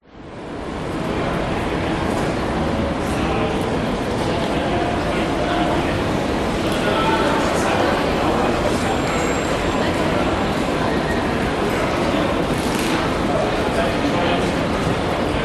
airport terminal busy sydney domestic australia; public places

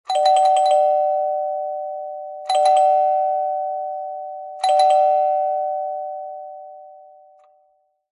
household doorbell ring fast several impatient

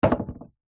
household deodorant can down on wooden shelf

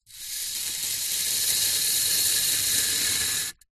polystyrene strip thin rub scrape wood; foley; zapsplat

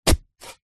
metal shovel spade single dig grass soil; industrial; zapsplat

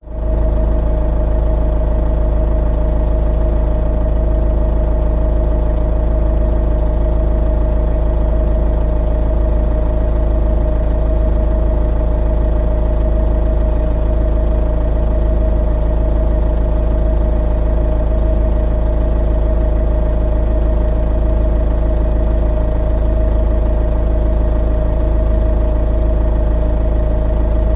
car suv holden captiva engine idle internal

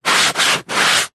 household sponge large wipes rubs cleaning slow; zapsplat

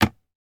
animals cat carrier box door close; sound effects one

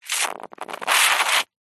foley liquid foamy squirt dribble foamy short

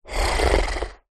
animals horse snort human; zapsplat

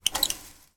industrial barbed wire fence movement

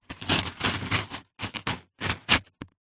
papadum movement scrape on another; food

